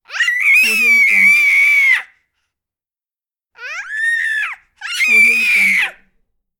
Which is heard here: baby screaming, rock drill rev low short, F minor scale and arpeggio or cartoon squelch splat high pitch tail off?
baby screaming